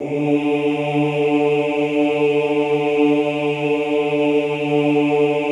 OOH D2, Classical Choir; Best Service; Extended Classical Choir; OOH MIX

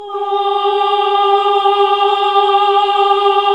OHH G#, Nuns Ooohh; NUNS AAH OOH; Sound & Vision - Gigapack II CD 1

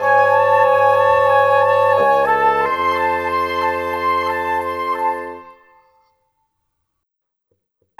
Cinematic Winds; Sonic Foundry (Sony Creative Software); Orchestral Series VOL-3 - Cinematic

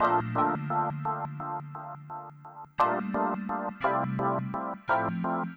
Soulgroove, Funk Keys; Sonic Foundry (Sony Creative Software); Synchro Funk

